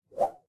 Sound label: wind Wild Move; elements